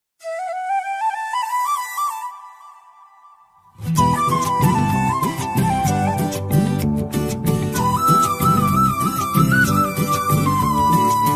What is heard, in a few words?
ringtone bgm tamil bgm tamil ringtone; Raayan